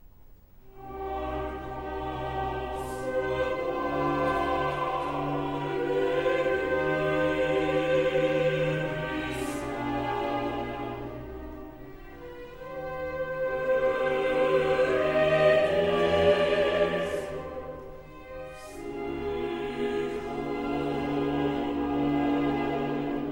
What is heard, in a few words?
Coro; Orfeo Euridice